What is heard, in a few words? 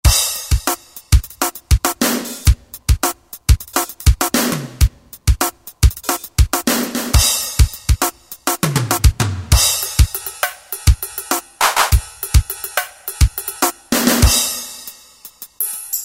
Drum Set, Roland JD-990; Factory Patches; Rhythm Set